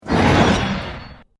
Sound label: fire spell